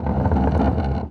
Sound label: chair